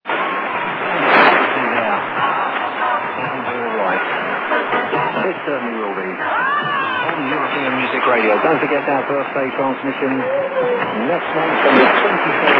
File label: 9290KHz; EMR